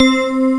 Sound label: Bell C; Star Trek demo; Star Trek Audio